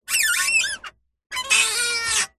household cupboard door roller squeaky slow movements; zapsplat